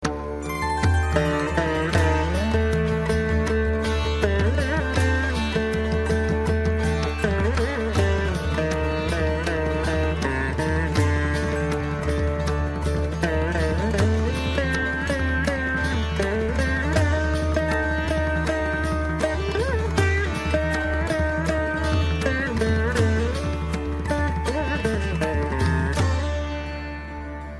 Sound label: sitar